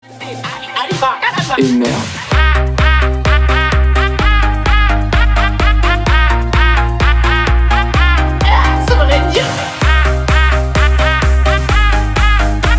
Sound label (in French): HAHAHA !! (MUSIQUE); langage Processing